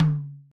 tom sample D key, tom tuned to the D note, Loudest frequency: 336Hz